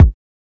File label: 2000s reverb kick sound f key; kick drum samples